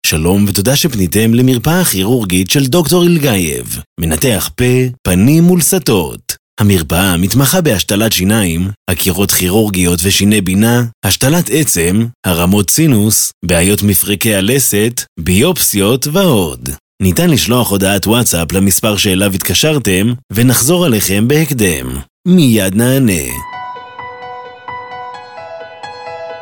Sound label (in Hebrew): צליל המתנה